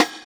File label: Conga; Equipped Music; Loungin House